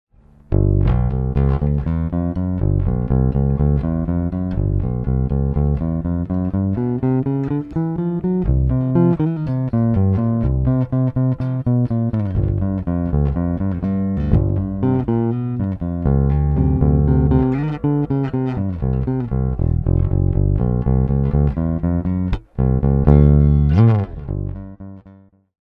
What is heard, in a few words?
1971 SB-400 neck, gibson bass